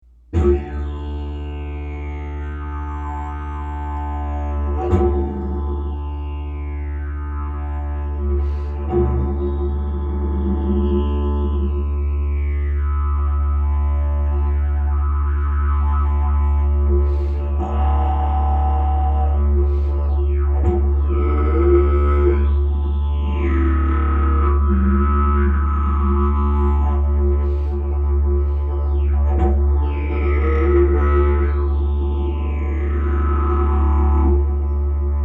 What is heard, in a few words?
didge